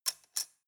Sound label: cutlery plastic drawer place